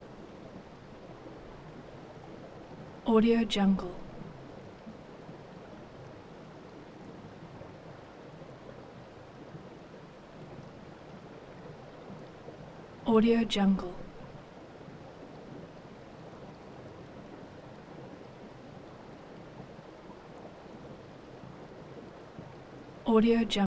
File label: stream water flowing small river